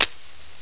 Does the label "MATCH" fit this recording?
Yes